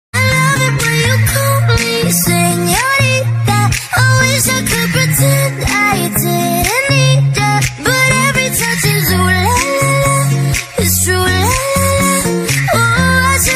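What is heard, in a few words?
english feeling music ringtone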